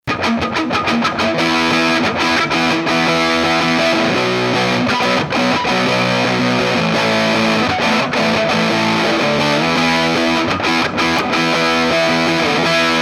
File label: Punk Sample